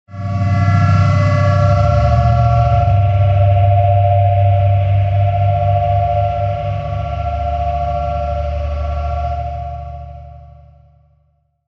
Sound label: atmo; oracle